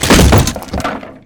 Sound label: wood furniture break; physics